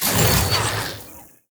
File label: power conduit cast shot; heroes kosmyr